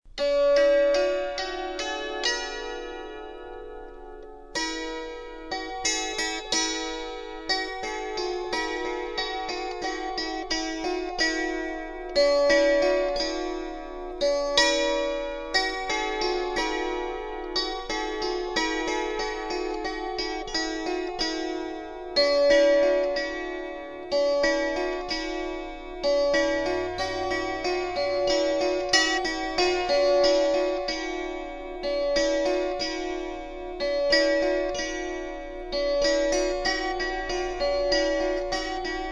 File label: Kantele